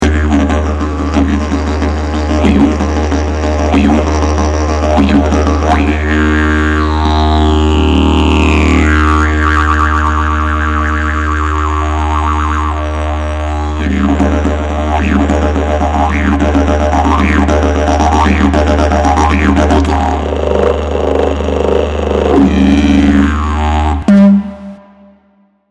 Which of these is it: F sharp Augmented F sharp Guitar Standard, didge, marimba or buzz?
didge